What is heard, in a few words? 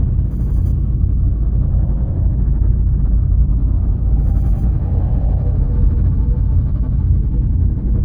ancient space station idle; Stellaris; guardians